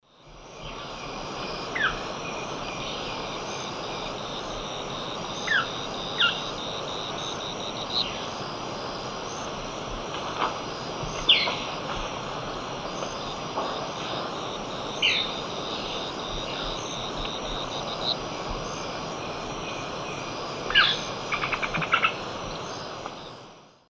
Cercomacra serva, calls, Eastern Lowlands, S. America